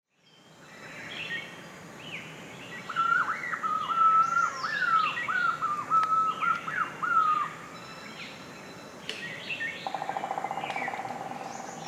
Ashy headed Green Pigeon Treron phayrei, ashy headed green pigeons call